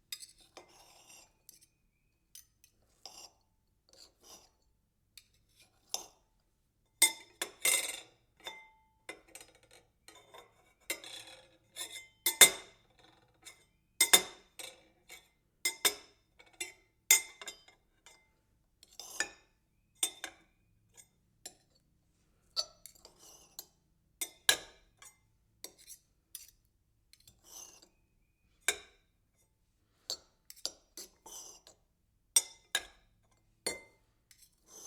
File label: knife cutting and rattle